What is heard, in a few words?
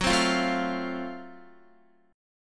Gb Minor Major 7th Flat 5th, Guitar, chord; strummed